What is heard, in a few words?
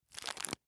Peppermint Candy, Peppermint Hard Candy Wrapper Open; Food; Foley; Household; Blastwave